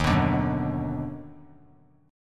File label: D sharp Minor Major 7th Sharp 5th; strummed